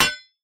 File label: hatchet strike; rust